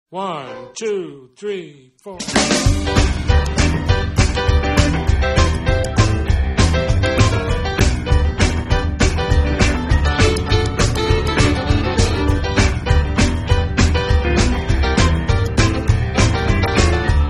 jungle

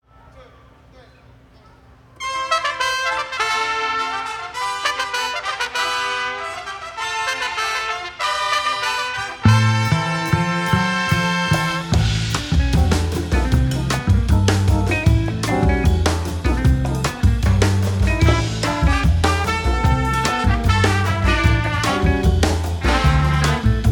trumpet

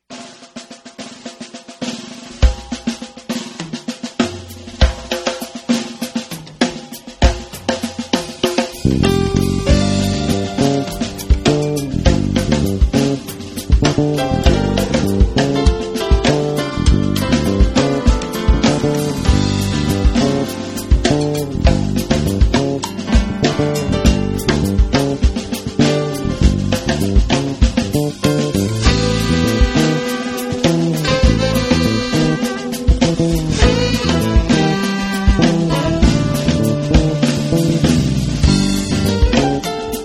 scifi

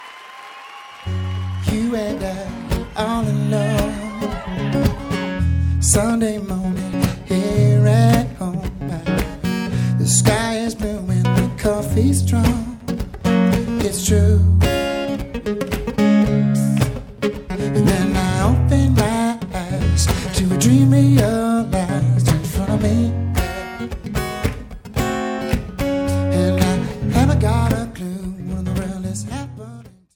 solo

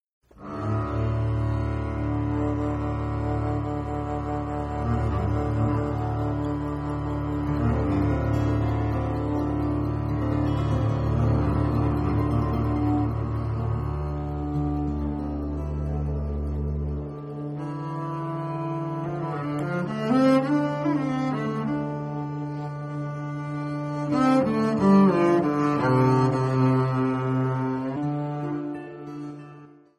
groove

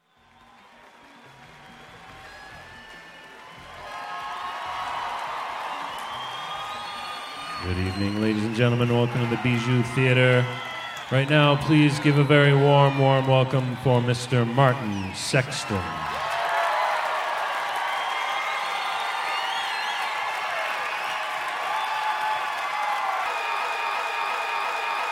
solo

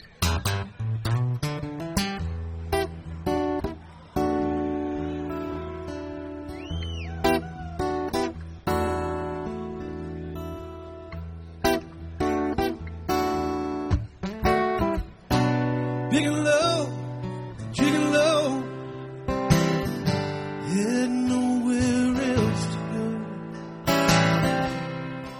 jazz

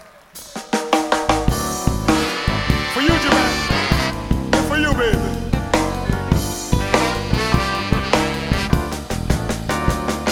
jazz blues

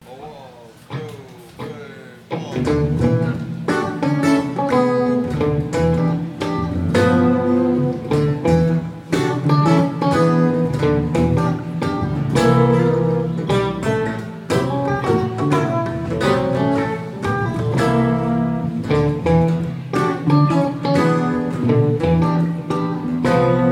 guitar

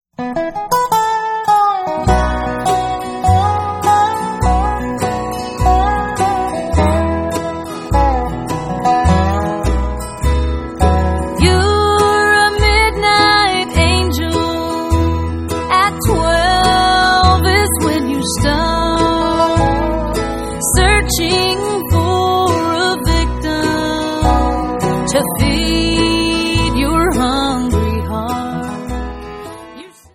bluegrass

